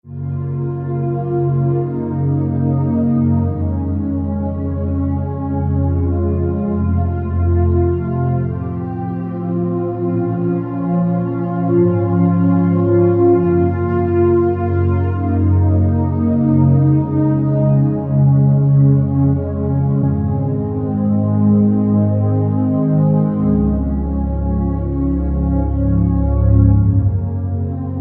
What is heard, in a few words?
Underscore PAD; Worship Flow